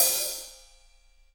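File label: HH, hi-hat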